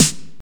prominent snare D# key

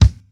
short kick single shot g key

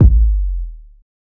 short reverb kick sample a key; kick drum samples

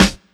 boom snare sound b key

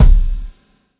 tight bass drum single shot C key; Duration 0:01

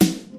fat snare drum sound d sharp key; snare drum samples

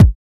subtle reverb steel kick drum A key

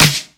dry acoustic snare sound D key; snare drum samples

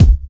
sharp kick c key

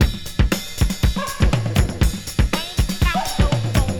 120 bpm hq breakbeat B key

